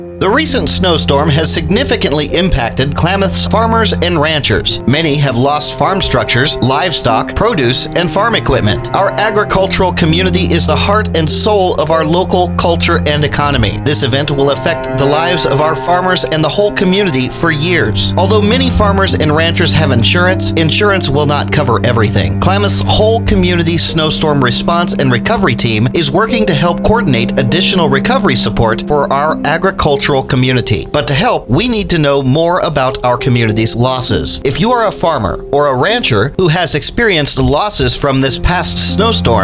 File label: SNOW CELLO BED; UWKF; KKFO